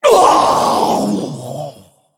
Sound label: Female Dragon Death